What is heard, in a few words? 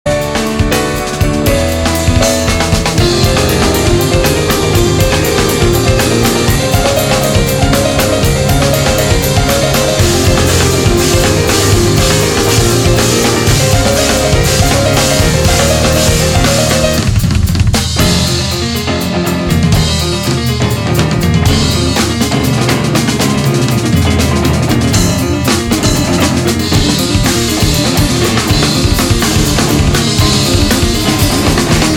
clock pulse